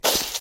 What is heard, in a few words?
footstep; figure